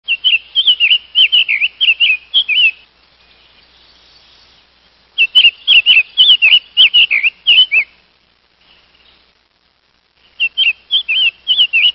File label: Summer Tanager